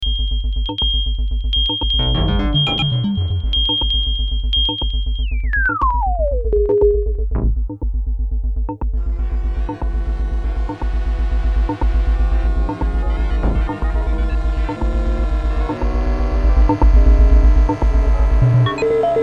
Hydrasynth Microtonal Madness

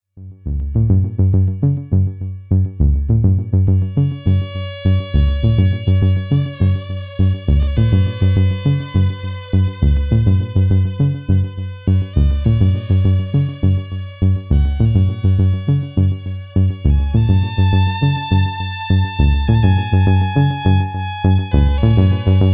Dark Energy II Soft Sequence

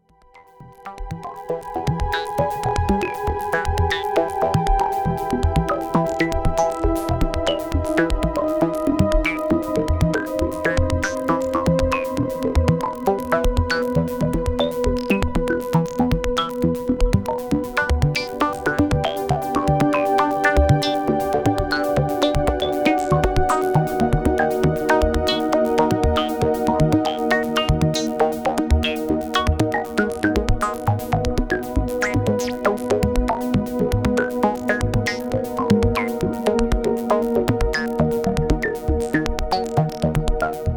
synthex mix

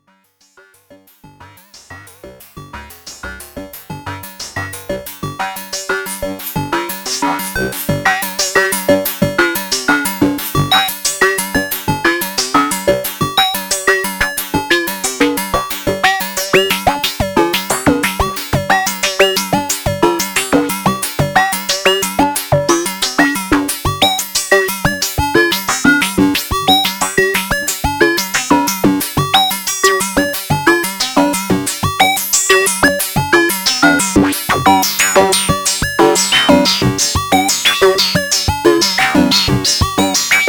MicroBrute Sequence

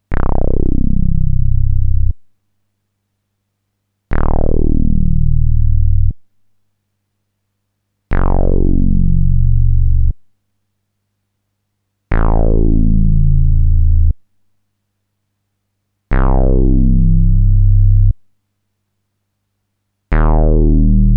SQ Bass, synthesizer

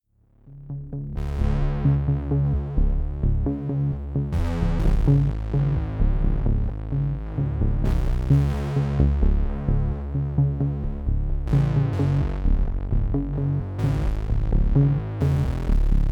Eurorack Bass 24db

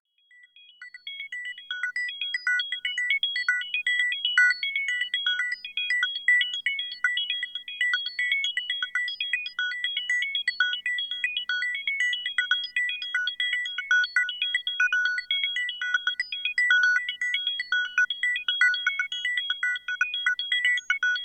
s3 rhythm

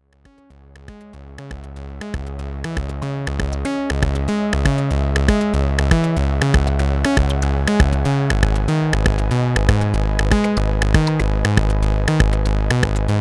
Roland JD800 TR808

